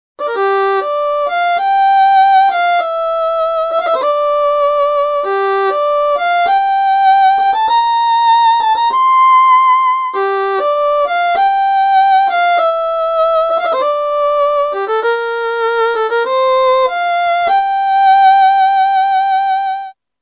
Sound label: violin wet